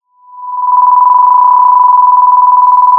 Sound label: Sound of a phone ringing